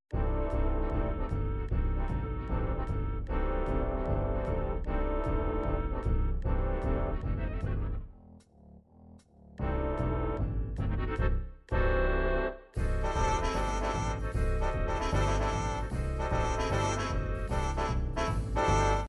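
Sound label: jazz big band ballad, midi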